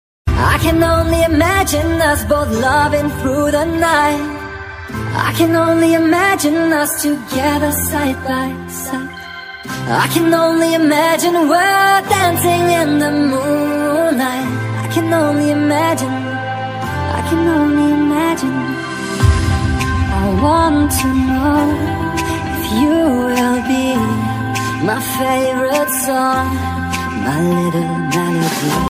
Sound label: beautiful english song ringtone